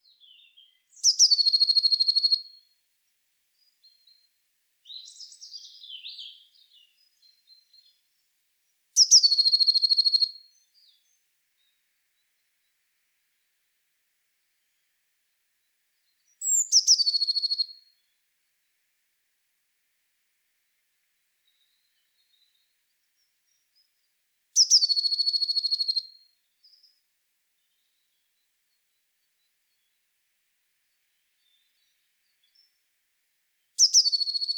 Cyanistes caeruleus; SETE

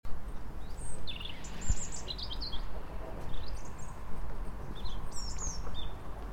Erithacus rubecula; SETE

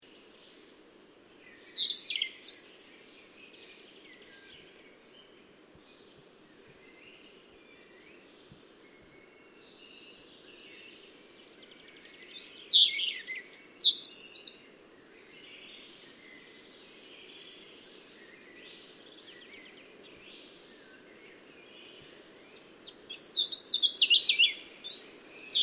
Erithacus rubecula; SETE

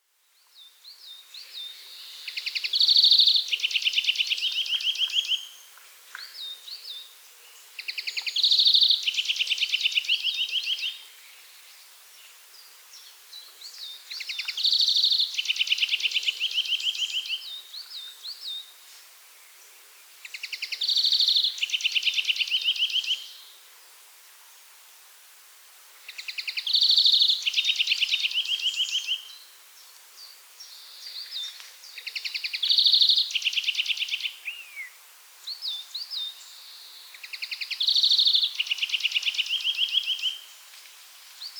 Chloris chloris; SETE

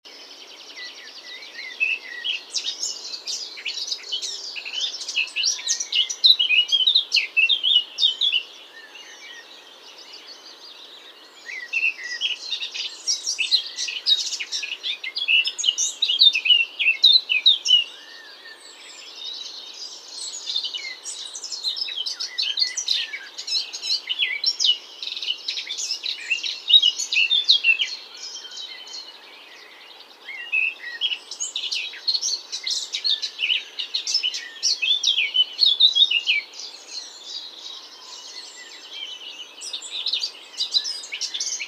Sylvia atricapilla; SETE

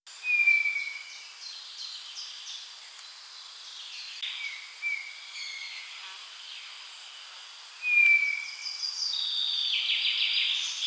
Pyrrhula pyrrhula; SETE

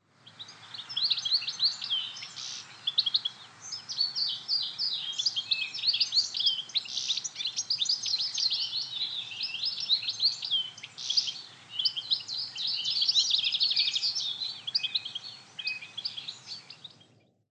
Carduelis carduelis; SETE

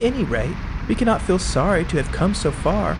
noisy

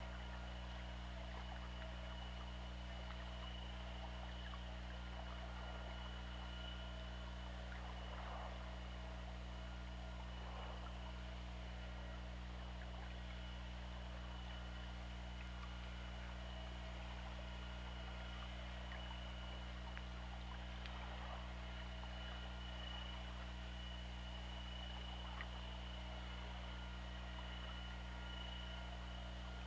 Orca; OrcaLab

